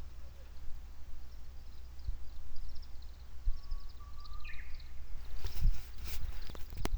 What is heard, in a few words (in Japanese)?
uguisu hatsune